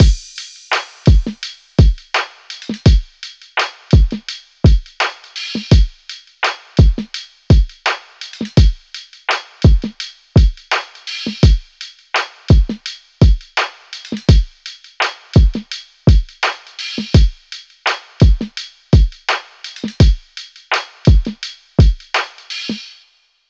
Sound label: drum